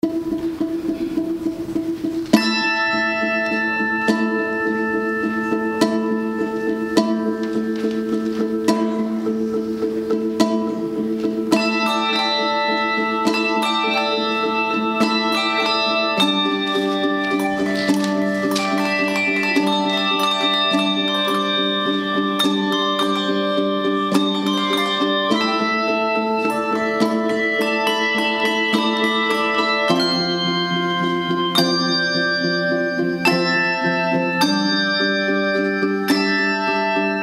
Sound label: Bell Choir